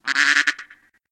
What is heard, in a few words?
duck quack fail; DuckShoot